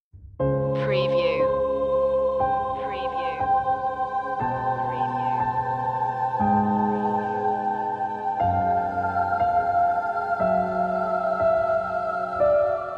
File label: sinister halloween twinkle twinkle